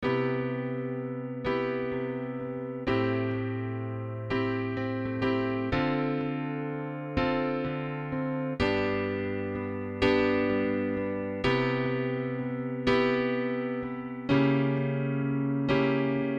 cp70; Arturia CP70 V

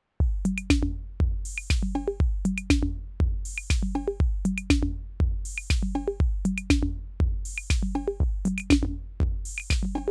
TAPE ECHO Drumloop; CHERRY AUDIO; STARDUST 201

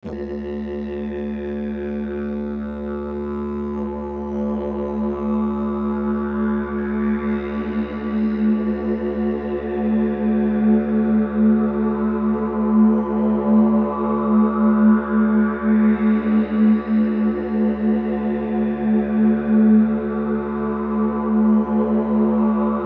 Reverb Drone; CHERRY AUDIO GALACTIC REVERB

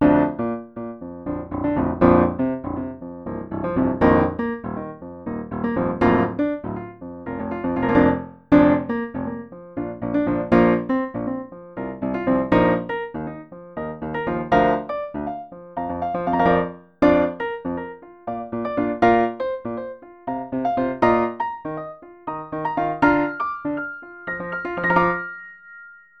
Piano Upright; PianoV; ClassicU3

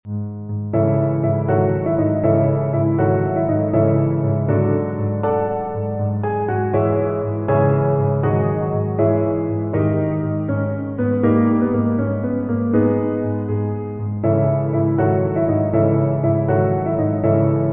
piano acoustica

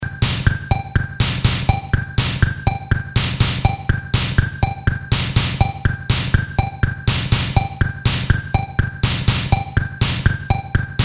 casio vl1

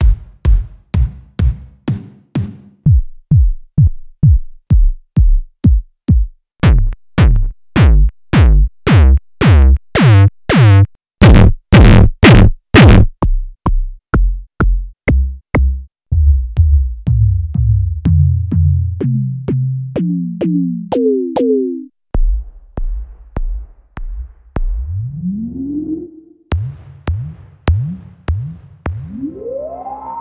Drums

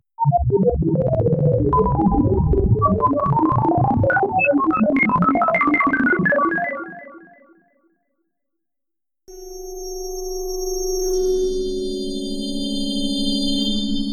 Synclavier SFX

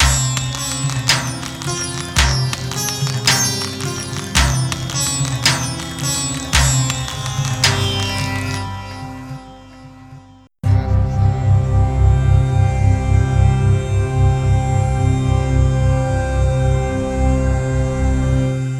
One Note; CinemorphX Presets MC

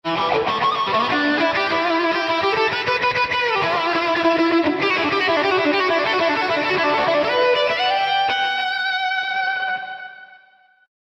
Lead Only Faster; RiffStation

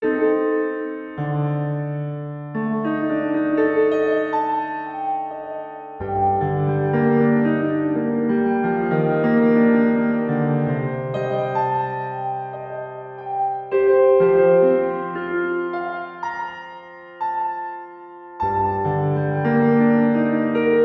Piano Distant Room

